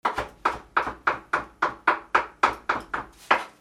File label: KITCHEN